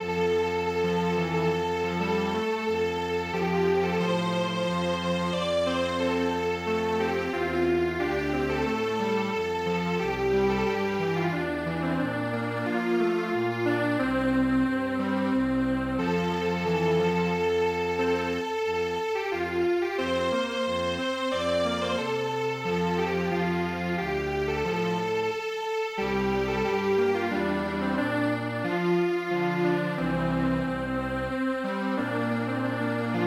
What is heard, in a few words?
Strings Quartet; Torachi